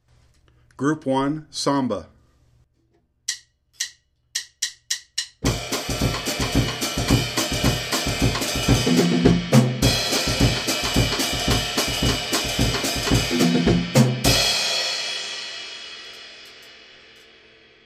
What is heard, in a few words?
samba